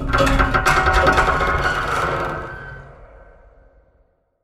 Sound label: metal stress; Titanfall 2